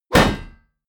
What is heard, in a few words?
hit shield metal; hits explosionen; metall